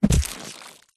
impacts body hit baseball bat hard whack crack squelchy bloody